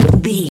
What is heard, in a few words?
footstep wood land